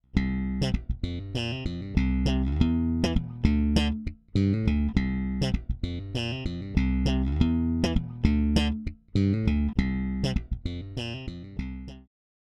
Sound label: Marquess Bass